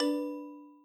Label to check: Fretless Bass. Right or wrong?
Wrong